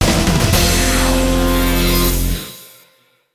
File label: Something Bad Shows Up Fanfare; paper mario 2 the thousand year door